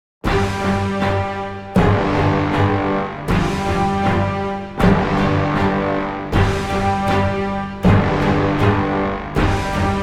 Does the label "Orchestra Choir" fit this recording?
Yes